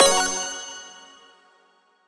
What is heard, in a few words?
menu freeplay click; 『Mikan』